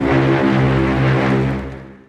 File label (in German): schiffshorn eines grossen; salamisound